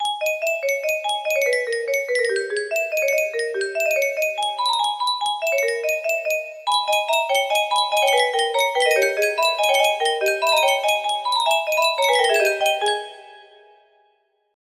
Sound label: 5 semitones down, melody